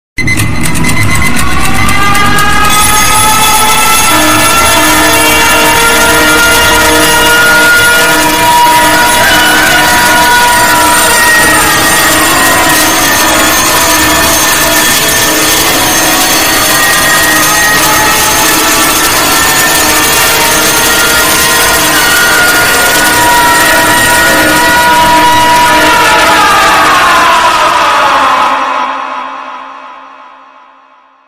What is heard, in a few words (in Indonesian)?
nada dering alarm berisik